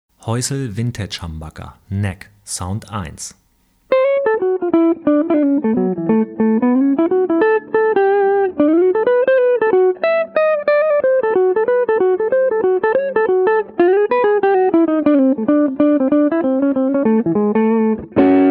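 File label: Häussel Vintage Neck Humbucker, Jazz & Swing Sounds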